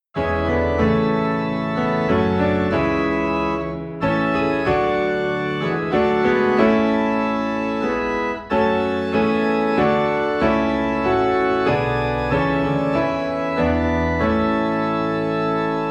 Piano and Organ Low in A; The First Noel; christmas

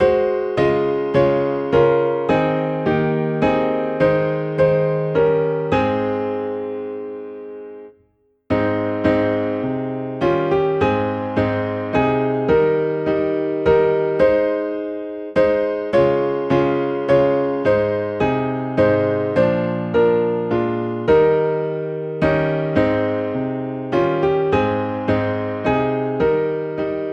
Piano in A Flat

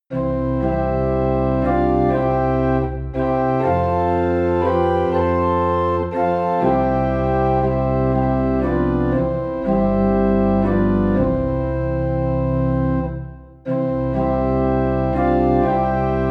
Organ in C; Sweet Hour of Prayer

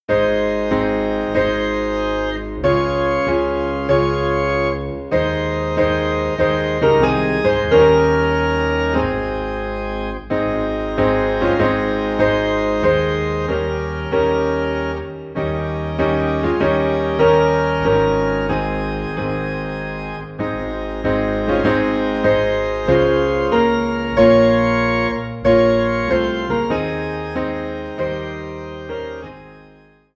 Piano an Organ in A Flat; Bring Them In

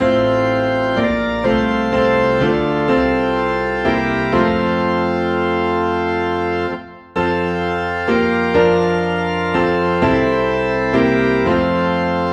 Piano and Organ in F

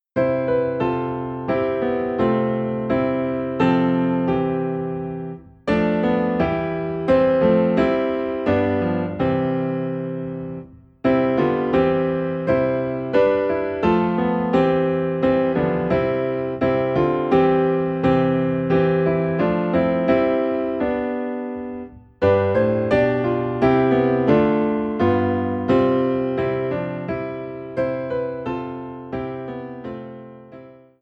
Piano in C; hymns accompaniment; christmas; While Shepherds Watched Their Flocks; Christmas 25 Hymns accompaniment

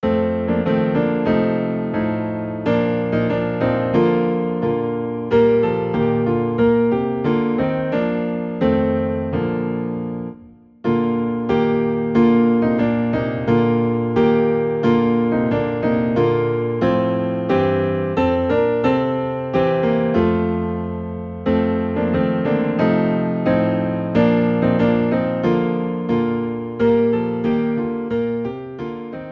Piano Low in B Flat

